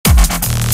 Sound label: Dubstep